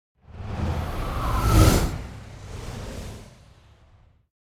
Dragon Flying Fire Movement; Hollywood Edge; sound designer's toolkit v2

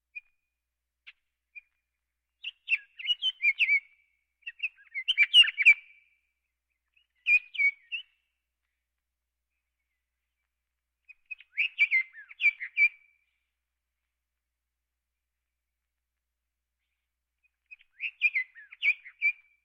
Birds

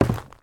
zombie footstep wood; DeadFright

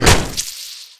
agent attacked; Apotheorasis

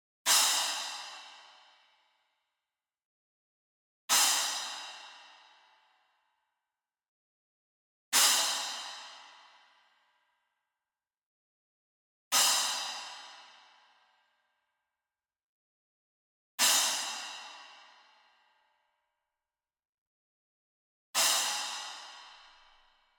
CYMBAL Splash Hit Hard Beater Far; boom library; Cinematic Strikes Construction Kit

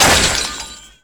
Chain Break; SFX Kit; Creaks, Scrapes, & Misc

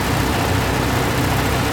Machine Generator Loop; Machinery - Generators

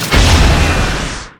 Rocket Launcher Shot; SFX Kit; Weapons

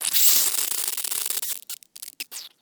Nasal Spray; Medical; Blastwave

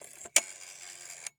Telephone Rotary Dial; 1001 Sound Effects

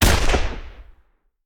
Machine Gun; Sound Ideas; Guns

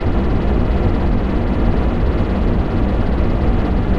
Scifi Machinery Loop; SFX Kit; Sci-Fi Loops

